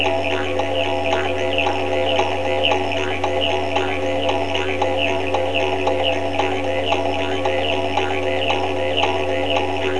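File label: didgeridoo, Australia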